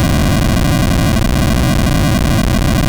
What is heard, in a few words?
noise, NES; MIDINES